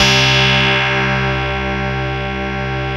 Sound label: MIDI, OLD PPG; Sound Gallery - Charged